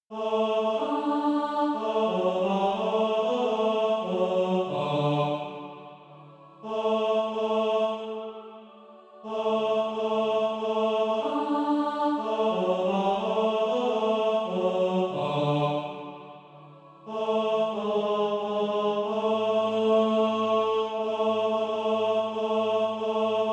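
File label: tenor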